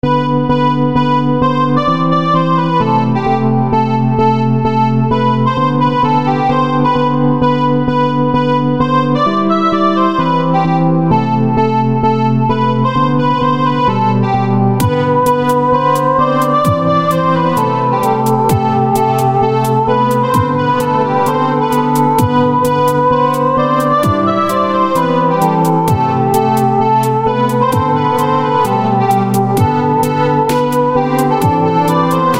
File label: Chiptune Test